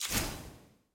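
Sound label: sfx end of game ui neutral burst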